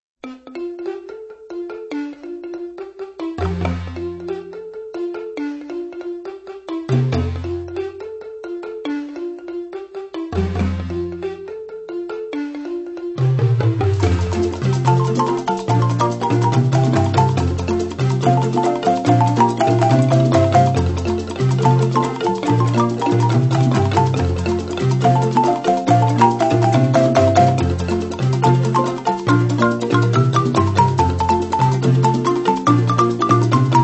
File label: Balafon Marimba Tropical Marimba